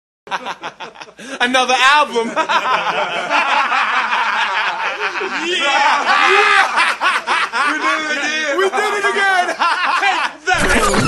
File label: Intro Scratch; UK Punjabi; Project Serato Bhangra 2